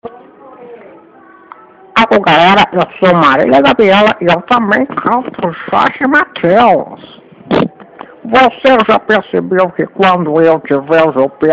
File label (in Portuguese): canta tempo ao tempo